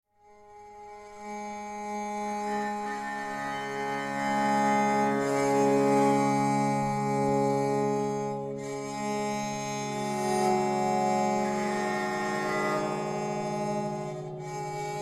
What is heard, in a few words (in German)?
Doppelpsalter